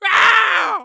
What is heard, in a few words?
Struggle; Osfala struggling against Yuga's magic; zelda